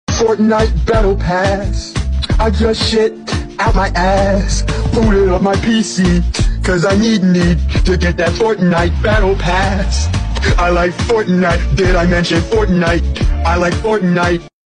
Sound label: battlepass song; fortnite